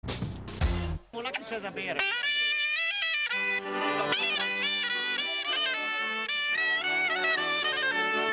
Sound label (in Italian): suono piffero